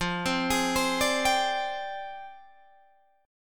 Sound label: F Minor 6th Add 9th Guitar DADGAD